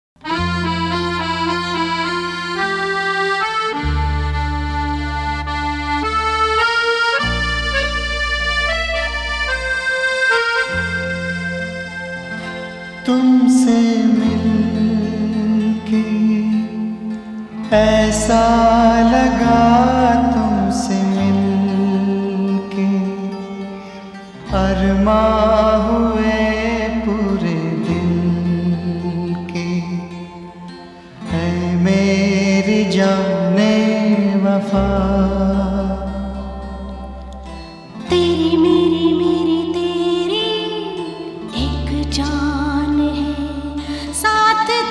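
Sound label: Sad, Hindi song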